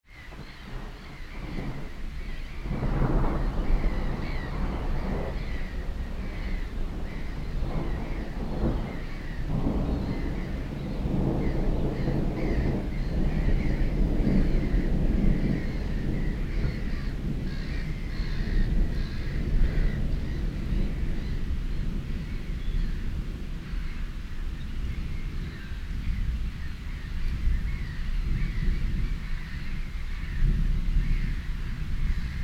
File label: thunderstorm over a lake